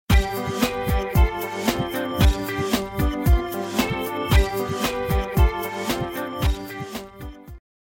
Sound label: Ringtone